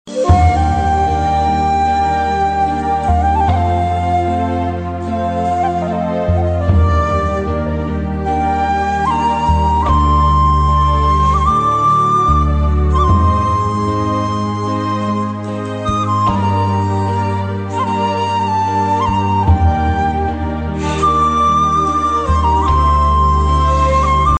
sadness ringtone

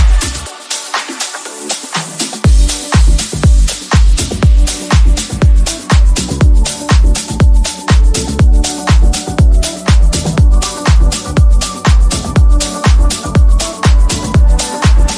dj ringtone

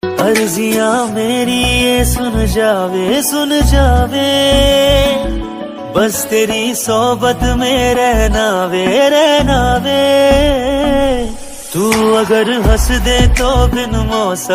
dj remix ringtone, bollywood ringtones

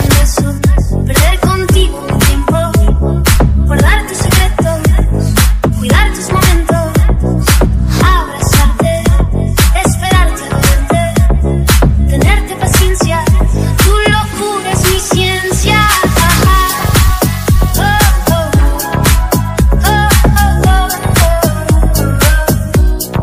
cool ringtone

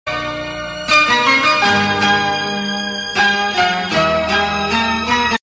strings ringtone

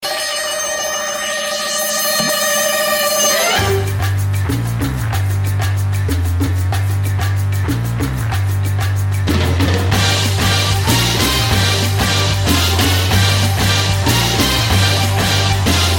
classical ringtone; english ringtones

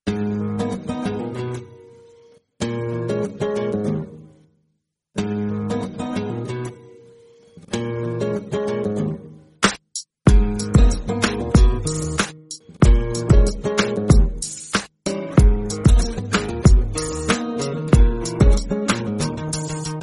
desi guitar ringtone; bollywood ringtones

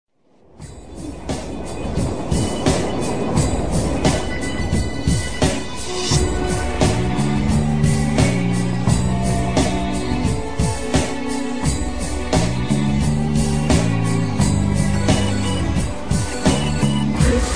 chant superior ringtone; boondock saints

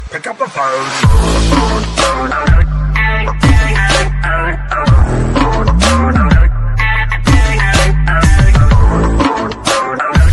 cartoon ringtone, funny ringtones

